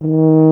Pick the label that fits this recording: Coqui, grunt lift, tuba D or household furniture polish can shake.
tuba D